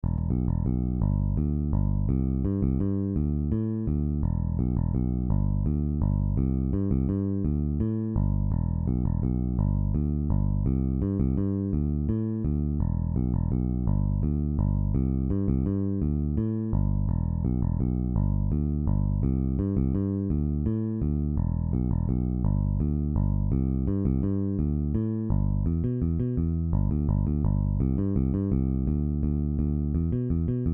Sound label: Bass